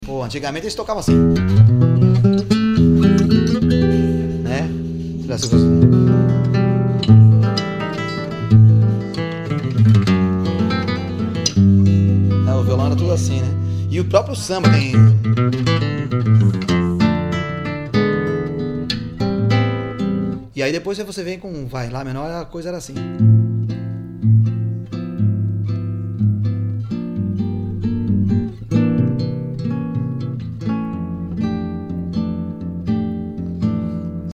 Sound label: bossa nova